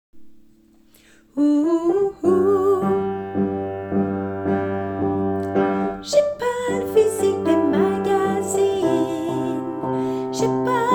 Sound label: soprano